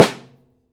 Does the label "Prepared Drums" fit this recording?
Yes